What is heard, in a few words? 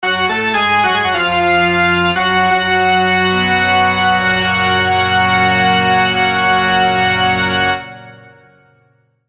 jingle; maoudamashii